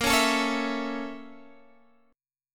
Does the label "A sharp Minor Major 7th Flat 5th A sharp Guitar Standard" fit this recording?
Yes